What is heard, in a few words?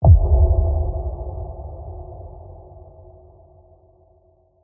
Impact; PMSFX